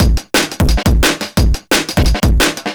175bpm, breaks; DOA breaks

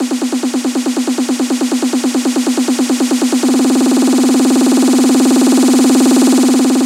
Fills, Loops 140 BPM

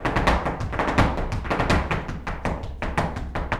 Flamenco Tapping Zapateado Solo

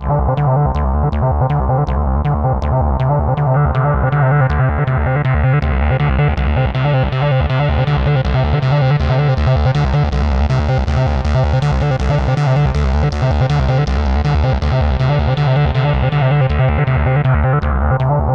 TRANCE, SYNTH LOOPS; Zero G; Malice In Wonderland

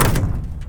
Foley lo

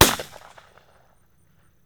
SHOT

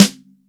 MIX SN, DRY STANDARD SNARE

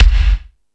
Discoball, Drum Machines E-MU Pro-Cussion

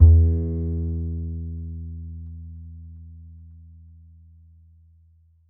E, E string upright bass; Larry Seyer

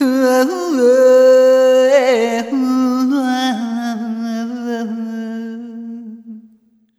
Arrhythmic A#; Sonic Foundry (Sony Creative Software)

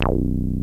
303 PLUG, BASSES; Pro-Rec - Synthsonic

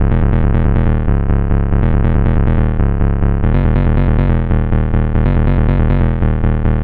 Synth Bass

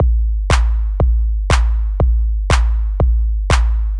120 trance beat, Drum & Perc Loops

